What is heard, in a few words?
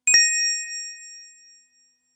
new message